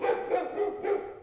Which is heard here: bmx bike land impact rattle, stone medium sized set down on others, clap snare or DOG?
DOG